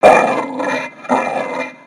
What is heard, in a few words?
Pet Dog Robotic Angry; creatures; pets; Lego